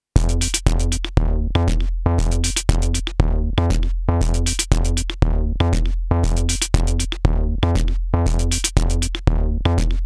Rupert Neve Designs Portico 5017 DI Driven

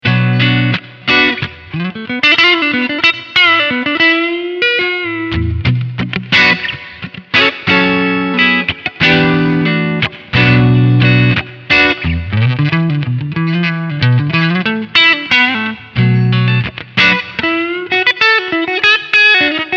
Front SC Clean Jammin

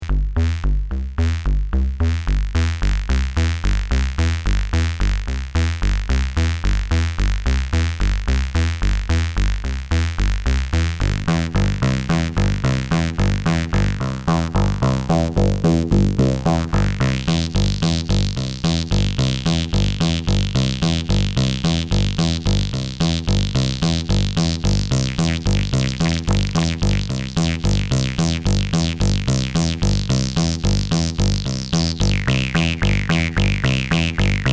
BD Plastic Acid

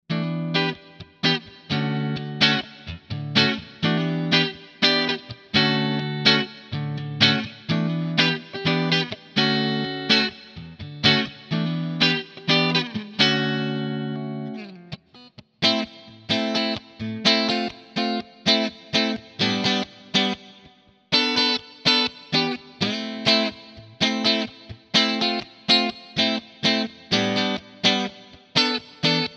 Epiphone Coilsplit Neck to Bridge

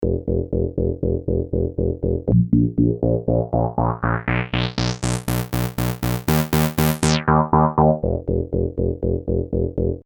Moog Bass

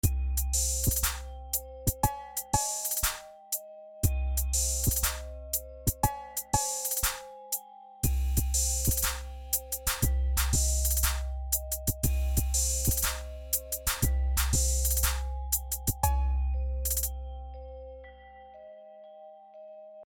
chopper kit flex mix 120

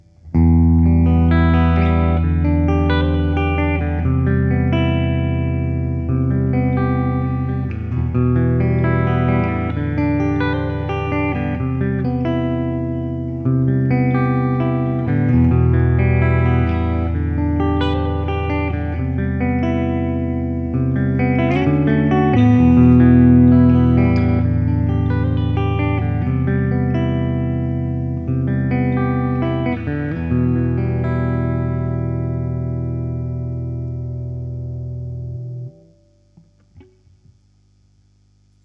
gender clean

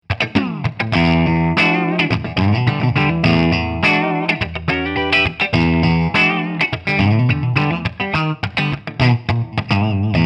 Gibson LP 50s Both Pickups Clean

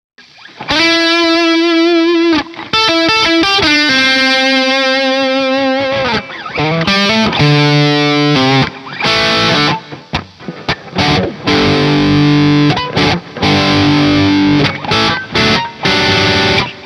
Friedman JJ Junior full gain

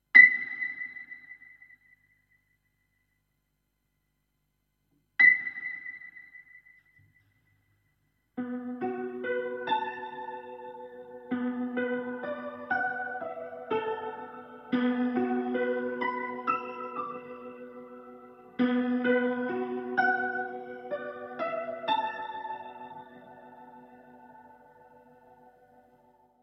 Piano, EHX Lester K

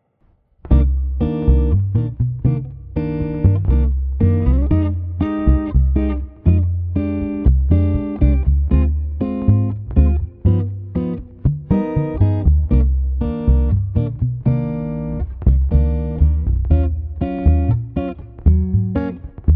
Jazz

